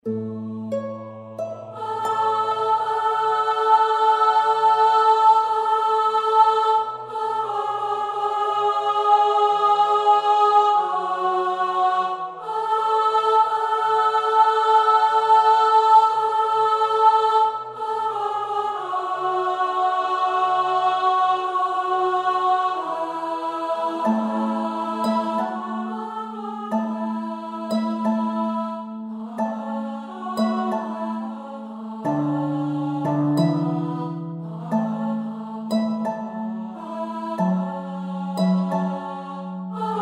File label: Alto